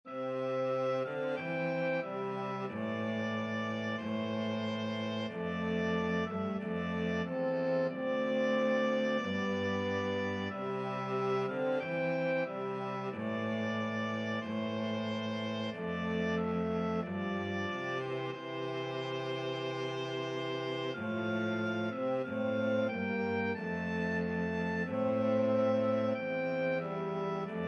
bleak midwinter, flexible mixed ensemble 3 players